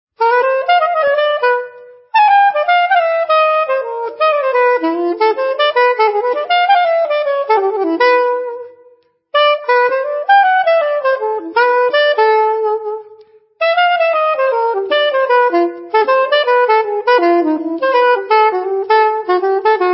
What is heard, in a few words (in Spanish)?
Saxo soprano antique; Thamnn